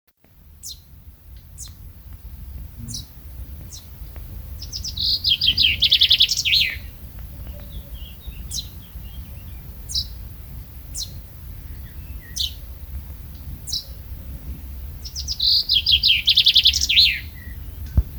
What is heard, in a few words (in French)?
Pinson Des Arbres Chant